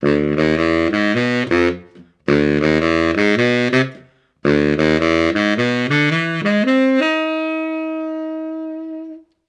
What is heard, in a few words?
Bollywood End